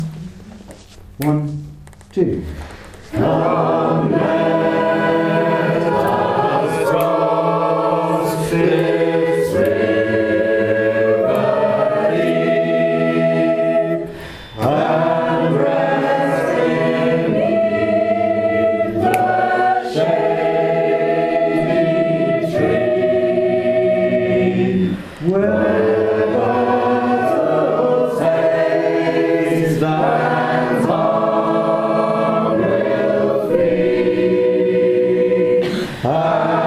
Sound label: Shape Note Singing; Oak Grove